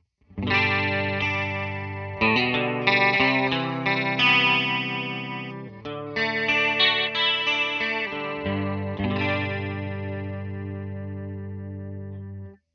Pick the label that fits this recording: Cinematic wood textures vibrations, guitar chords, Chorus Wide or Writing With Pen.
Chorus Wide